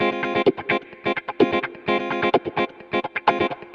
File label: Electric Guitar Kit F min, 128BPM; Vengeance Samples; Special Sounds